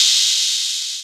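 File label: hi hat open, Yamaha TG-500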